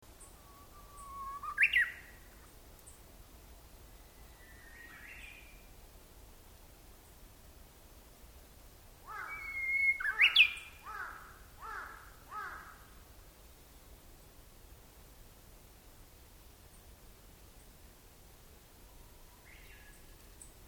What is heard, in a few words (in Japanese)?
uguisu